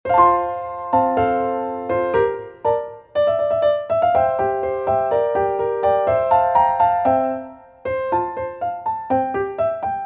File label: piano; 元気 楽しい